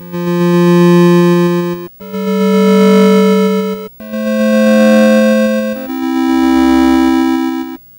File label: Atari 2600 Synth Harmony; 8-Bit; Chiptune